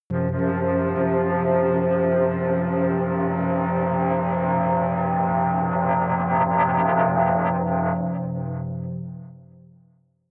atmospheric feedback synthesizer long medium, drones